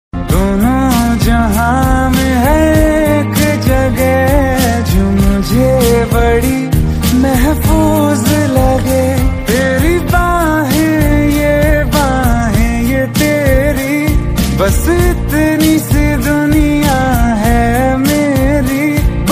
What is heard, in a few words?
Hindi Ringtone